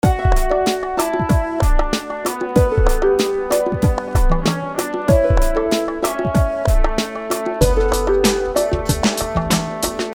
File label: Transition